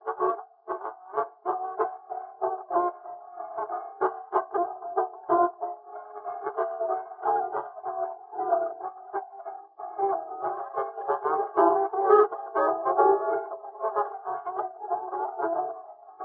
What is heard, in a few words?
Jazz